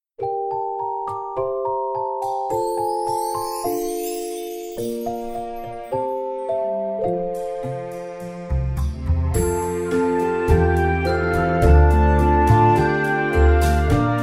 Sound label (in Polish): instr